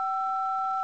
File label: beep